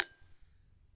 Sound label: saucer